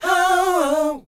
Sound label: SHOO B G#B, R&B & Dance, Vocal; ILIO; Vocal Planet VOL-4; SHOO BE 80